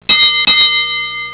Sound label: double Bell